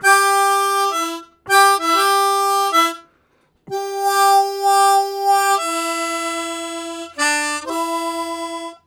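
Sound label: Octave Calling, Harmonica Riffs; Zero G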